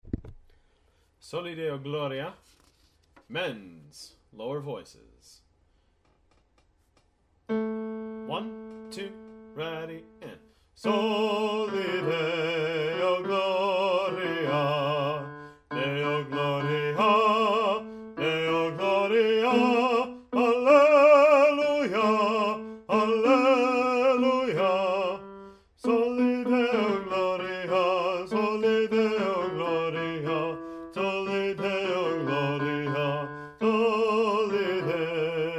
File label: Baritone, choir